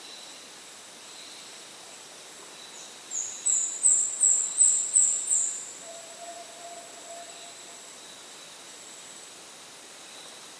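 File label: Speckled spinetail, bird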